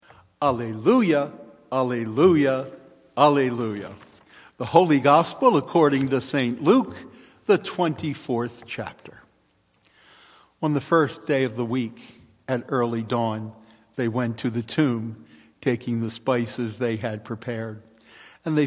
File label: Alleluia - Holy Gospel; Easter Sunday